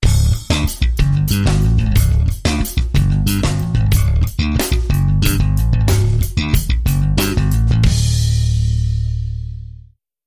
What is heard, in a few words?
Funk